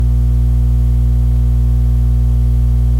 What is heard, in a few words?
Eletronic Static Buzz Microwave Buzz Backrooms Buzz